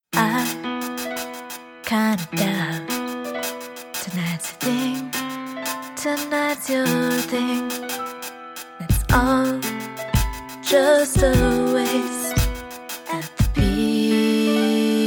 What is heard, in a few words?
The Beeps